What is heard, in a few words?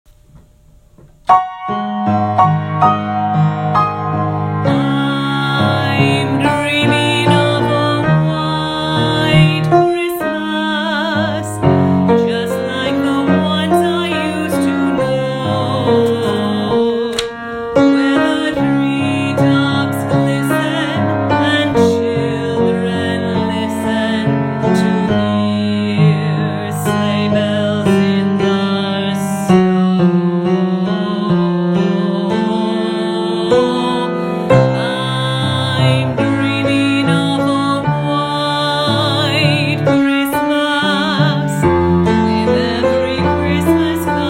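Baritone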